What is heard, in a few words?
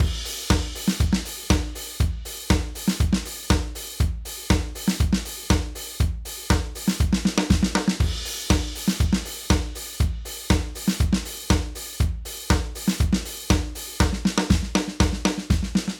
Drums